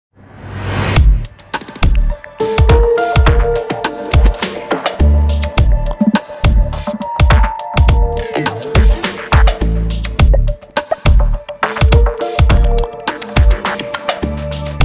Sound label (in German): Ringtone Zukunftsweisend; OEBB Ringtone 4